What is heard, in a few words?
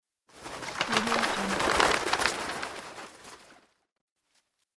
wings flap